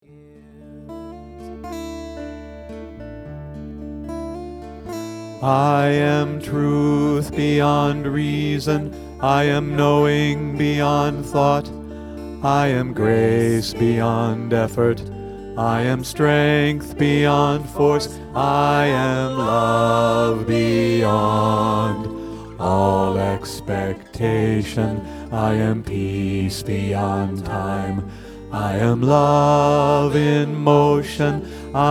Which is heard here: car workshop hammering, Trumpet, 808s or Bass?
Bass